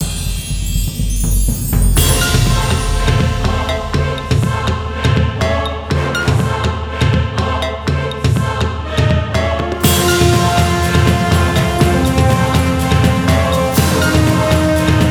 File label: percussion cinematic